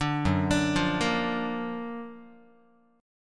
Gb Augmented Major 7th Gb down Guitar 4A,4E,5A,5D,5G sharp,6C sharp; Gb+M7 arpeggiated; JGuitar